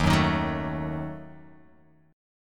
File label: D sharp Diminished D sharp Guitar Open F; strummed; D#dim; 3,1,3,1,0,x; JGuitar